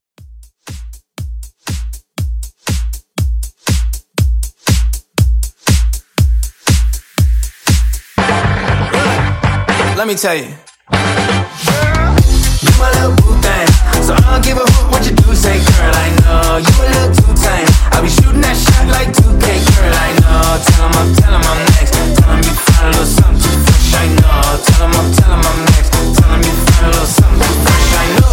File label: Upbeat Redrum